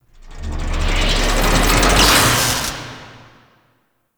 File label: Spawn Machine Electricity Zap; Echoes; Warframe; Entrati